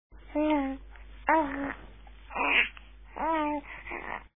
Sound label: babble; September 1: Babbling